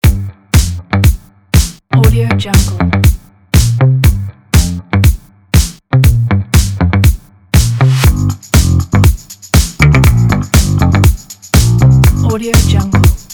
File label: the happy funk